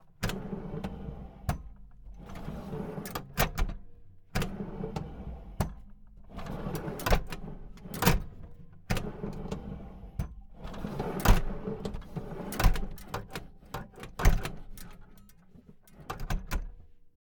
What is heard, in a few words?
cash register sanyo ecr 335 drawer open close; sonniss gdc